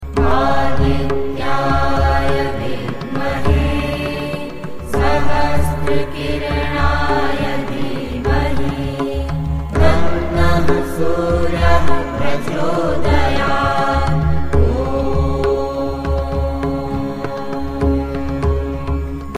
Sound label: Mantra; Surya Gayatri